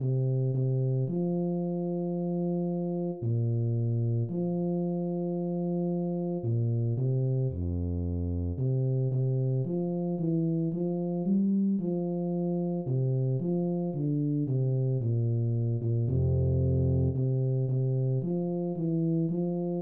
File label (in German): bass